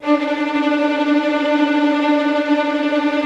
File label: TREM D4, Violin; Q Up Arts; Denny Jaeger Master Violin Library; PERFORMANCE